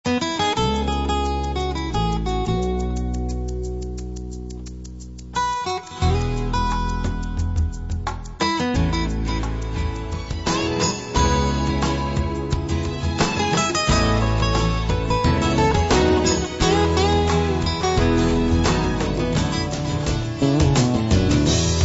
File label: acoustic light